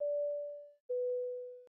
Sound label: Seat Belts Sing